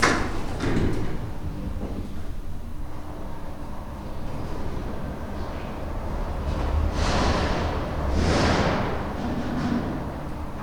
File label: ELVTR MOVE; 1000fx; ELEVATOR